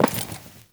Walk Metal, Footsteps; Enemy; Lady Deora

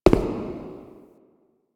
Shadow Figure Steps

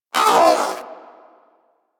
Vocal Pain; Warframe; Pets; Zanuka

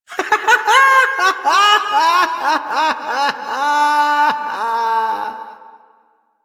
Laughter; Warframe; Entrati Doppelganger; Evil Twin

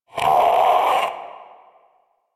Possessed Vocal Death; Enemies; Warframe; Priest Quest; Possessed Red Veil

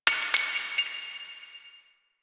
Metal Debris; Ambience; Warframe; The New War; Quests; Zariman

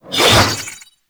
Sword Whoosh

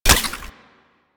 Flux Rifle Reload; Crp Laser Rifle; BE Flux Rifle

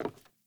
Sandals Walk Wood, Footsteps; Characters; Player

